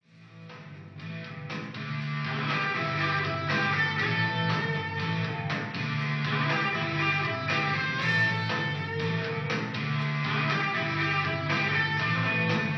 Rock

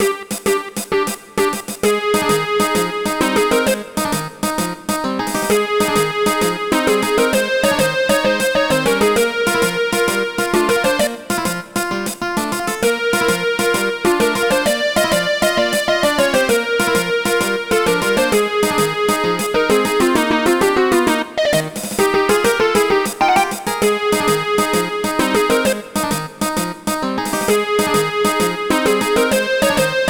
Radio March

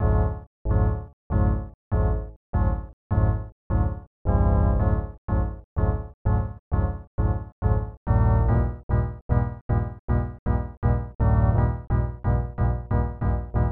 Organ Music; wayne gretzky and the nhlpa all stars 1995 snes

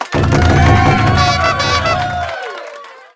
Donpafu

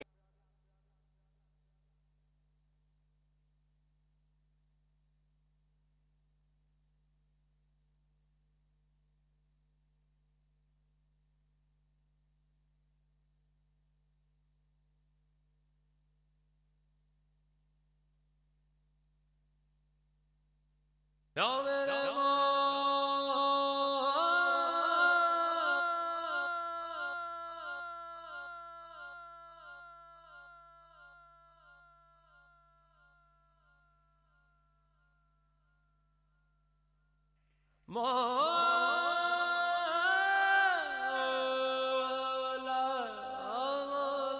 Vocals; PixelJunk Shooter; PS3

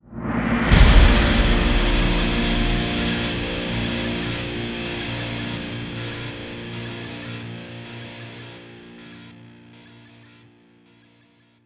GTR HIT; transformers war for cybertron gamerip

